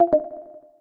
friend connect; Quentin C's Gameroom

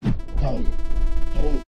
left slowdown really hard; Pitch Black - A Detective's Demise

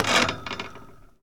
Street Ball Wood Back; SFX Kit; Street Basketball

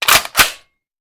Machine Gun Foley; Sound Ideas; Guns

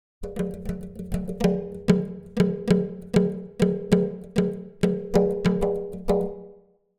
Drums Exotic Percussion Beat - Unison Duet; Hollywood Edge; sound designer's toolkit v2

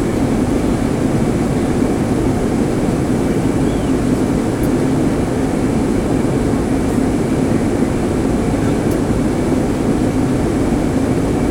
airplane inside flight noise; best service Blue Box

